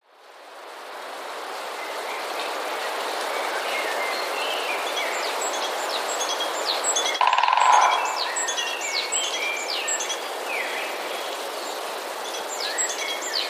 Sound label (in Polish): spiew; dziwny śpiew